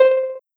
soft hit whistle

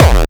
gabber

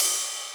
Ride

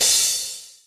soft hit finish

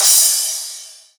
drum hit finish

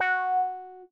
F#5 meow

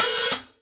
Open Hat

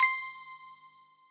bell C7

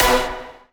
punch B5

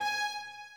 Violin

